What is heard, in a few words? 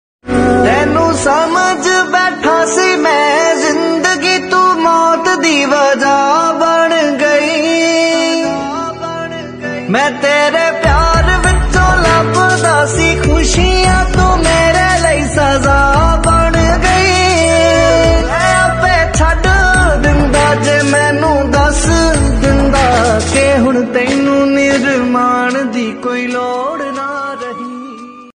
New Punjabi Ringtone